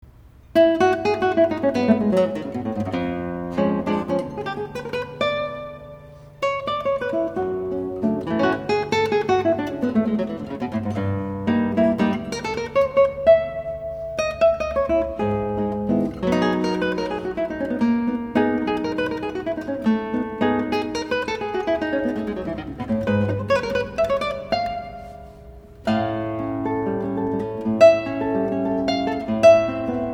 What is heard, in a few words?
Classical Guitar